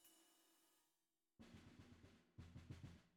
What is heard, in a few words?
SNR Bot